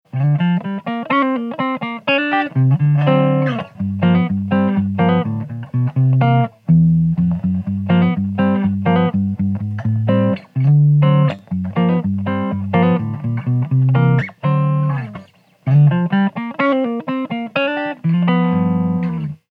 Clean Collings; Micro Crush

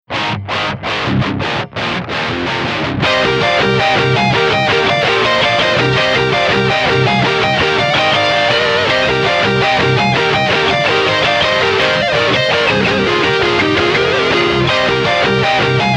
Rockerverb Dirty V; Rockerverb 50 MkIIH

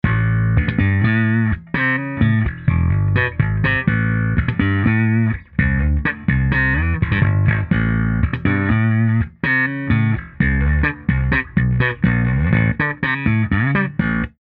Slap Fender P Bass

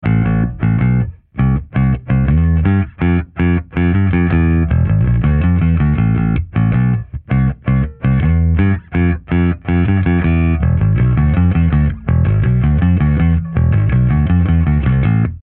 Terror Bass 500 SP212 Pick Fender P Bass

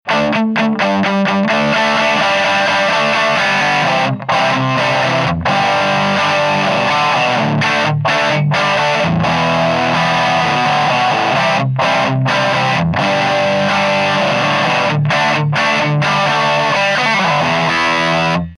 Dirty Collings; Micro Crush

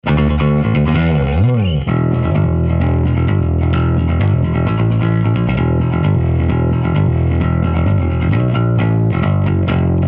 Pick Fender P Bass

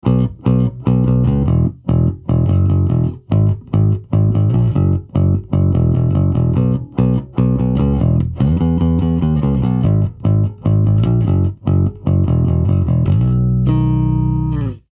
Pick Fender P Bass; Terror Bass

